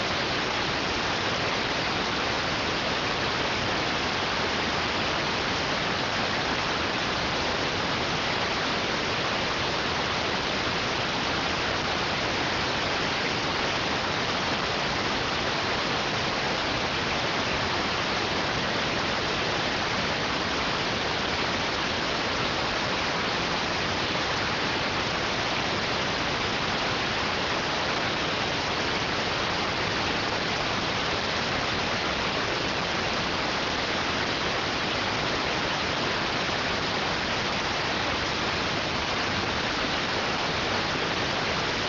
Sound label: rtty rx